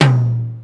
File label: Tom Mid, Ensoniq Mirage